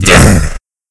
pain; sleeper

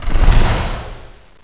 active, dragon

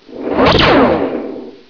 repel

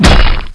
axhit; venom

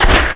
smash

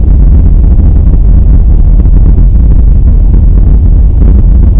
rumble, equake

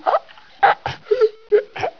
drown

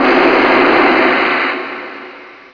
pain

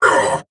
pain; doomguy

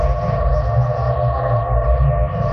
rotating plats, ambient

